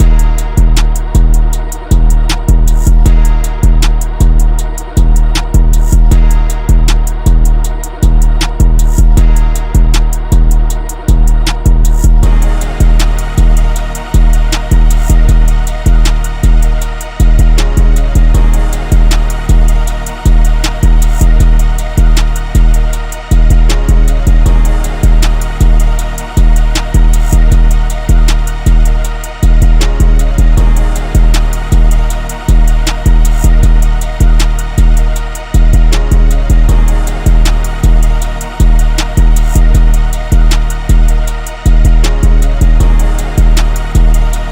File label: Instrumental